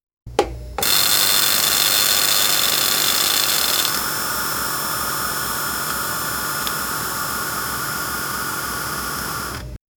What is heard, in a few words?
barista tamping espresso, coffee